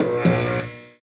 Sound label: Hemogen Amplifier Working Stop; rimworld